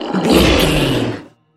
troll monster effort action release

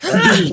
voice female attack

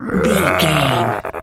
zombie voice grunt

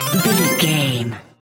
collect item jingle

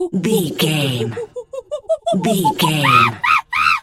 monkey chatter scream

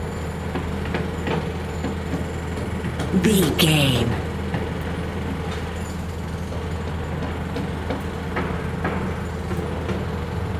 background construction building loop

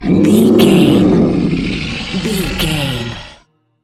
troll monster growl

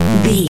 retro damage hurt ouch

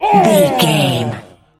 voice male battle shout short

